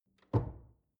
drawer large trash close door

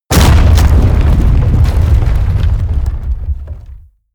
break wood large

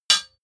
hammer hit metal bright

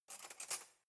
cutlery knife drawer grab soft

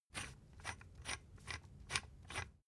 thread screw screw

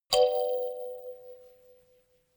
notification kalimba rattle triad minor high

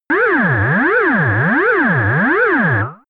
alarming arcade

